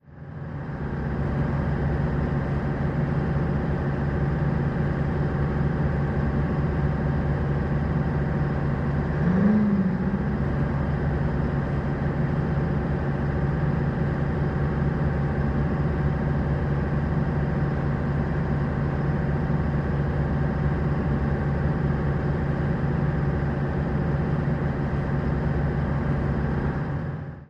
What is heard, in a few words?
Military Pbr: Onboard Medium Speed, Steady. Dual